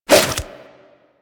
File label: Nunchuck End Whoosh A, Infested, Weapons; Warframe